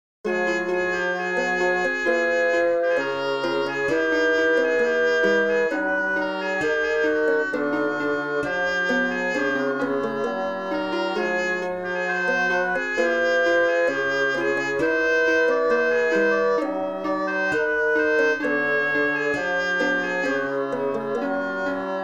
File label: baroque ensemble